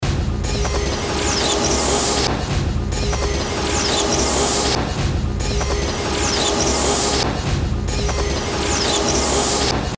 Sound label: musical fx loop